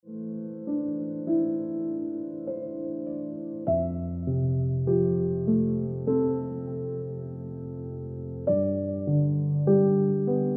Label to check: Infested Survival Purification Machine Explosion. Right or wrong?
Wrong